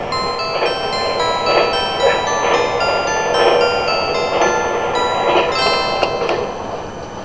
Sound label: chime